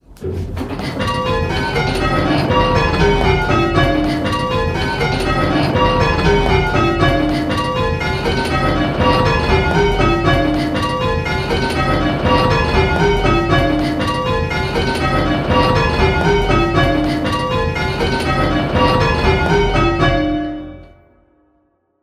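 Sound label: Bells ringing; Pebworth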